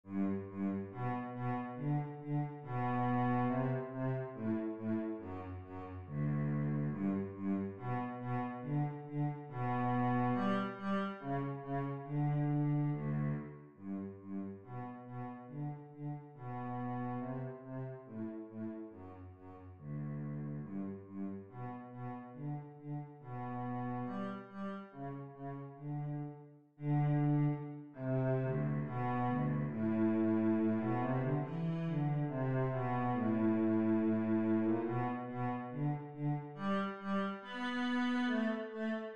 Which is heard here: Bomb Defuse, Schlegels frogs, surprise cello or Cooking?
surprise cello